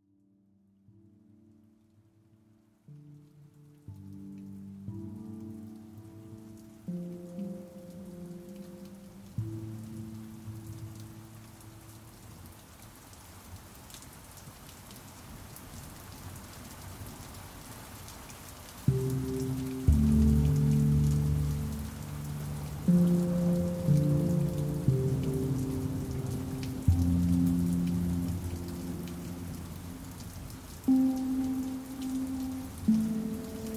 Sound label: pandrum with rain